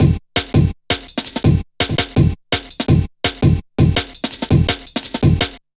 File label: Played, Drum Loops 166.5; Zero-G Jungle Warfare 1, 2 & 3